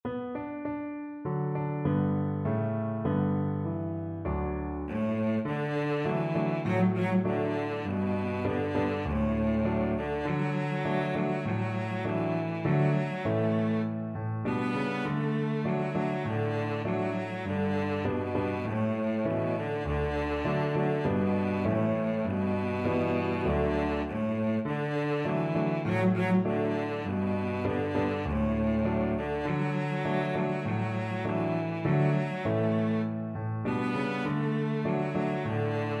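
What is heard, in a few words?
violoncello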